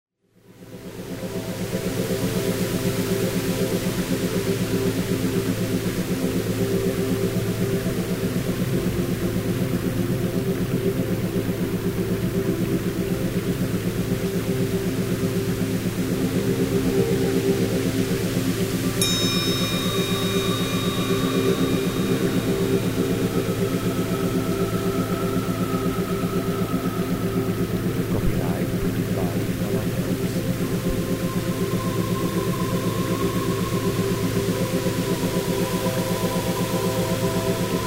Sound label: meditation